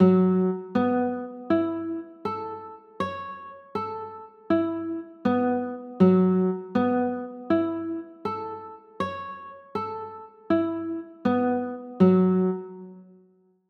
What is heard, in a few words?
d maj, chords; d maj13